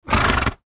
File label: horse breathing